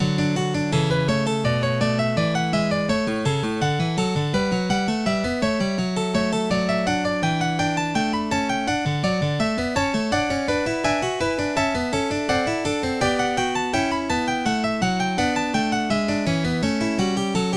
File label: prelude; OctaMED MED